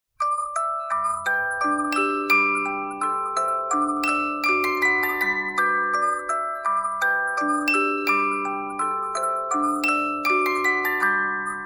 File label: Gavotte Minuet 2 part